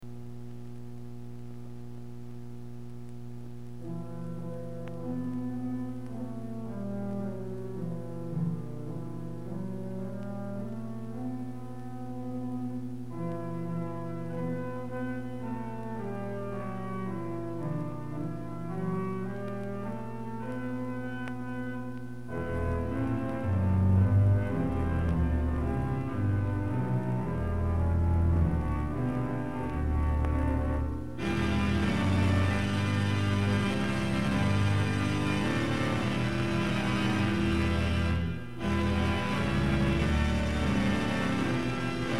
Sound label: Christmas Pipes and Chimes, Theater Organ